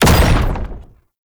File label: Perfect Heavy Attack Impact, Weapons Melee